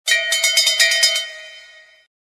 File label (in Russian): Звук Корабельной рынды